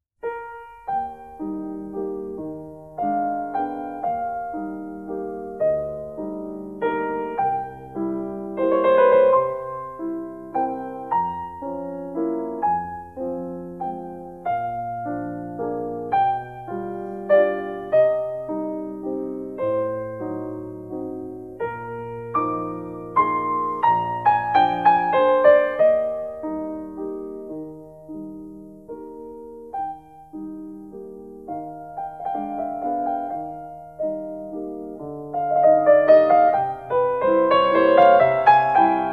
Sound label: piano